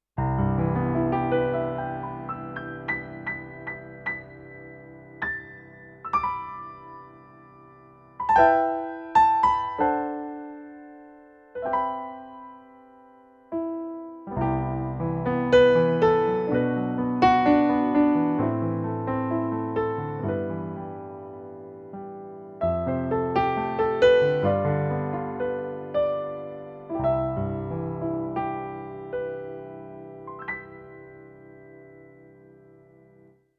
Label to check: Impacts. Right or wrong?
Wrong